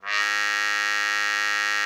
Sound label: CUP MUTE G#2; Xsample VOL-3